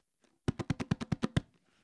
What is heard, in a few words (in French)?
frise, congas